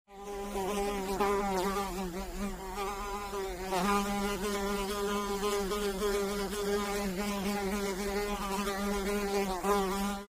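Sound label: muha zvuk, fly sound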